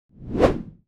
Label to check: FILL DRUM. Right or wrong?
Wrong